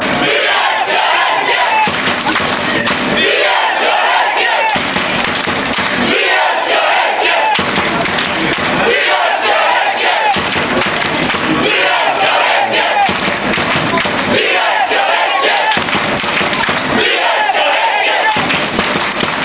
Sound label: we love fanchants; hacken